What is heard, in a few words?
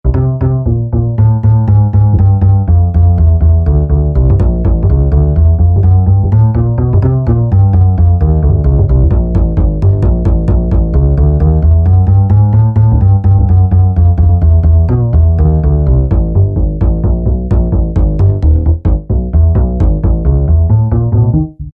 JP Hard; Roland SRX-07 Ultimate Keys